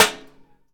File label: Hit Golfball Metal; Misc Sports